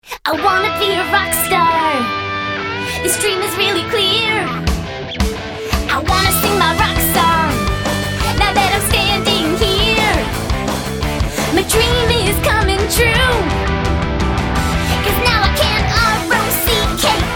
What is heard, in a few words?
Singing